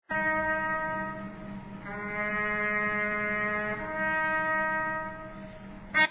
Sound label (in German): zapfenstreich